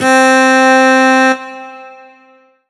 Korg TR Rack Solo Cello C4

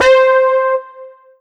Roland SC 88 Chiff Lead C5

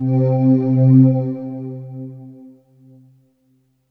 Ensoniq SQ-1 AstroVox C3

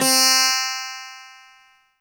Kawai K1r Sitar C4

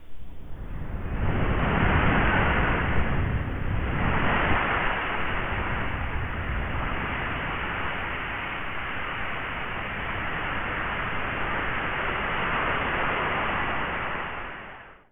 Digital Seashore

